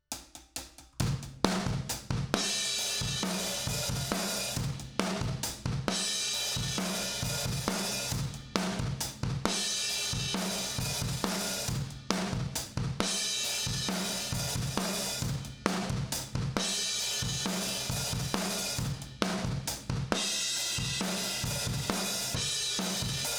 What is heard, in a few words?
Kit